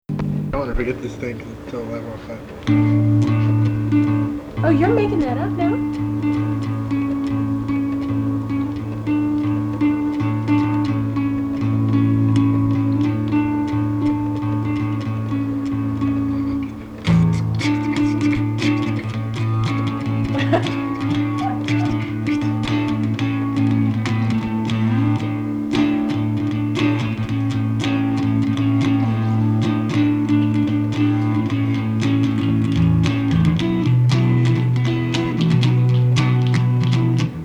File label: Modern Rock